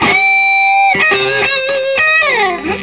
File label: SOLO, Vintage Blues Guitar